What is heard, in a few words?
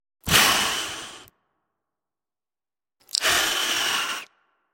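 sounds cat hissing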